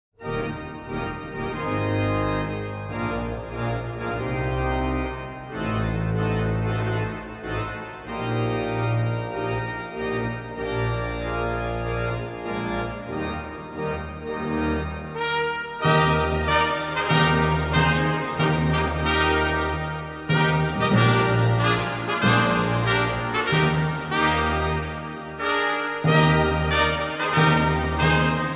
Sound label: brass; poznan